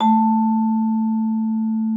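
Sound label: vibraphone A4; Xsample VOL-4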